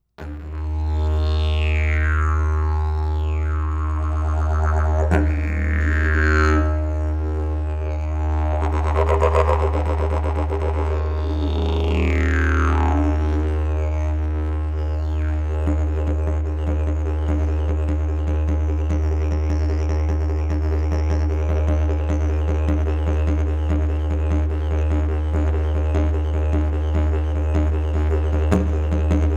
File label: didge